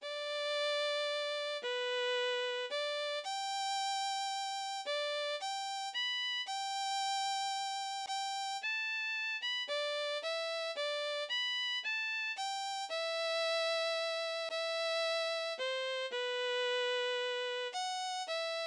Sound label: Reverence Is Love Violin or Flute Obbligato